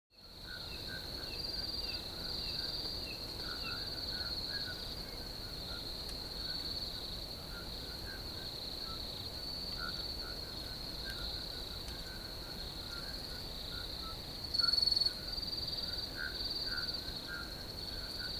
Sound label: pond ambience with crickets; mixkit; distantlands; cozy